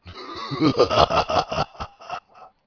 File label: Henchman Chuckle; Playable Tutorial Level; TR2